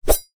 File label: Swords Sound; شمشیر